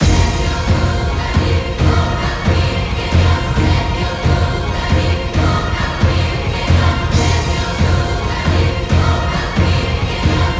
Epic Battle loop

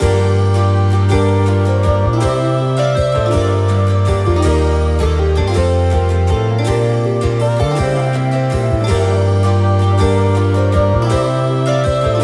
Deep Woods melody off loop

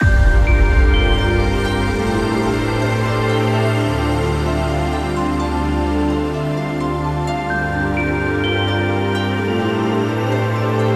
Firmament Calm loop

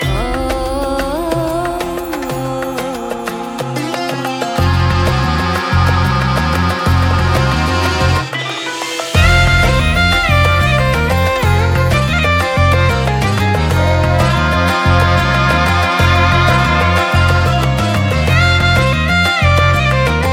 Desert loop

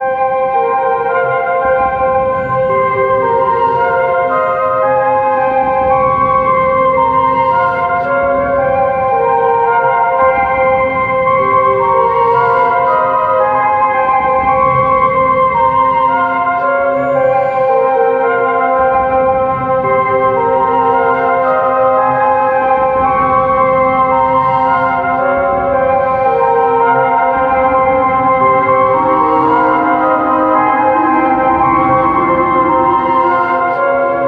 Scary loop

